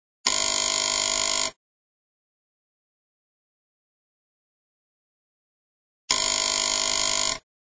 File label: ring-ring, phone